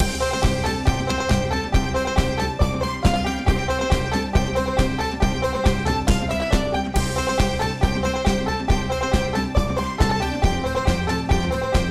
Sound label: BGM; Brazil; sloteliminate